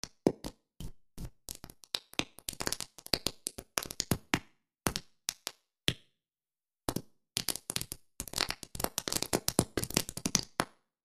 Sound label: Real Living Room; reverbs; M3000